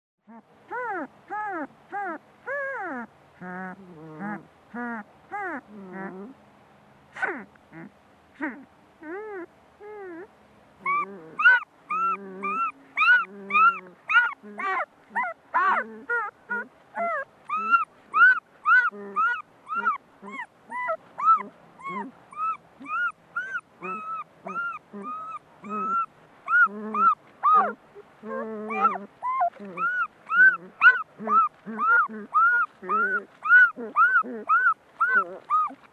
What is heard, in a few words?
animals